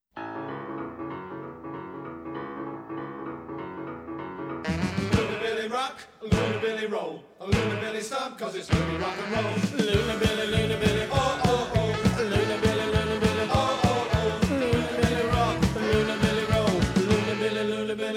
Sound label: Loonabilly Rock 'n' Roll